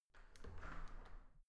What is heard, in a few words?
entrance door open soft